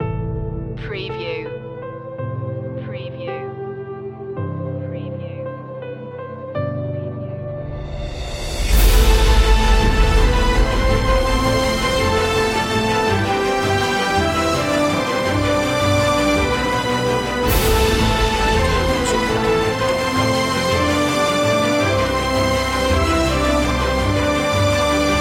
way to the top no perc, orchestral soundtrack epic themes